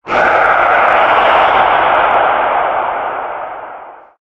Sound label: male scream, halloween; Source Engine; tf